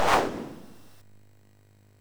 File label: SFX, MOS6581; Narco Police